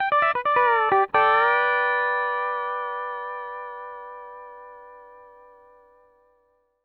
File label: L, Whole Lotta Country, ENDING140; Sampleheads; Whole Lotta Country 1 & 2